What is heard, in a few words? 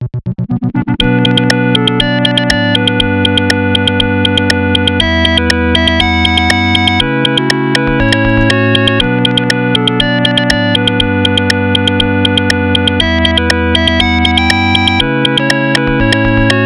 Sinewave